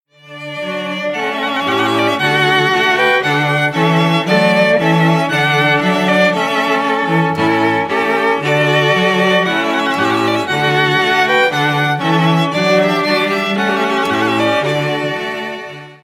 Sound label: Trumpet Voluntary String Quartet